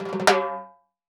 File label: Timbales Fill, Roland BK-5; Percussion